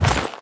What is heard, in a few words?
leather pickup, inventory; ui